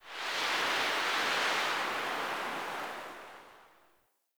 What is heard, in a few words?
Day Wind Gust Calm; Ambience; One Offs; Glades Of Andurin